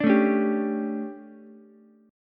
D Major 7th Suspended 4th, Ukulele; DM7sus4; strummed; JGuitar

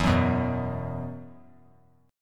E Suspended 2nd, down; Esus2 strummed

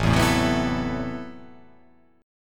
A Minor 6th Add 9th A, Chord; Am6add9 strummed; 5,7,7,5,6,6,6,6; JGuitar

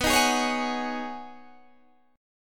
B 6th Add 9th B down Guitar Open G

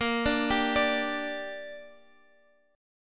D Suspended 4th Sharp 5th A sharp

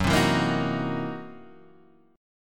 C 7th Sharp 9th Flat 5th F sharp

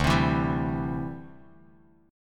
D 9th Suspended 4th D down Bass Standard; strummed; JGuitar

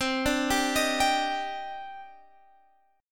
Eb Major 7th C; Ebmaj7/C; arpeggiated

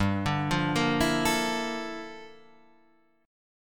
G Minor 13th G down, chord; Gm13 arpeggiated; JGuitar

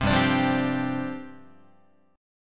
G 7th Suspended 4th G down Guitar

